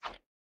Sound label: Swing Tiny